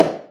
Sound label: drum hit finish; Snowy Circles NM (v1.1)